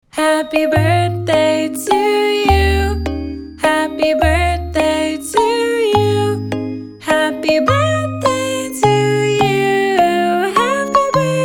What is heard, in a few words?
happy birthday human